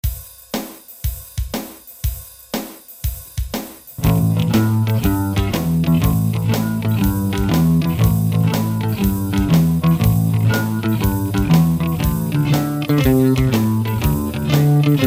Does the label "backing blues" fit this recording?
Yes